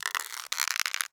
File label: Stretch Short